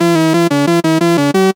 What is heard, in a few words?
Beat